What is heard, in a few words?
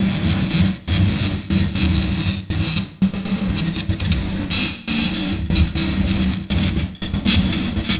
Envelope Beat; Drums & Drones